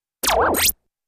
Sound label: Synthy Beeplike Zap, zaps